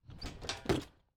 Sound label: Foley Tire Swing Pushed Towards Mic